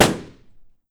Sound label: Balloon Pop; openclonk